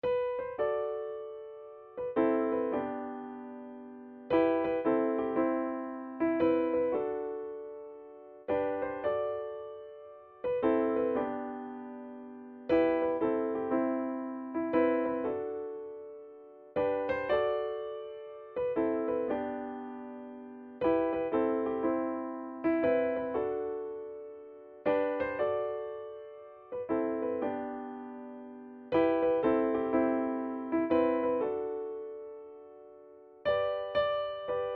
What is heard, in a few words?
Easy, Midi